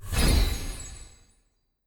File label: Transmute